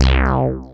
runt, Synths; Notes

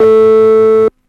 door locked, Synths; Notes

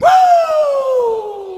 "woo", male

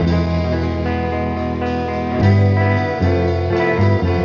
guitar, Jazzmatazz Loops

